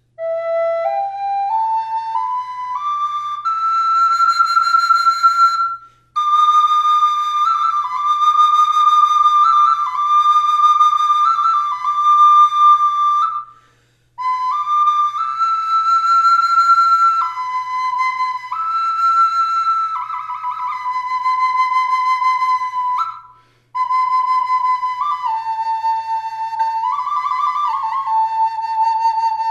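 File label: reverb, flute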